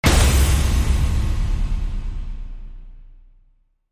Big Fat Stab

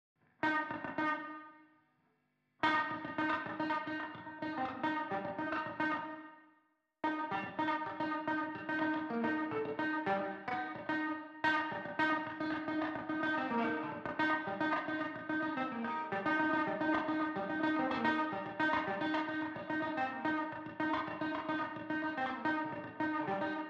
Guitar

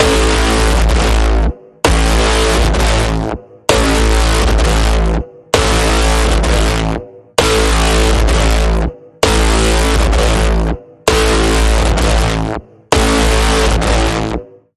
Pulsing Code Generator, A

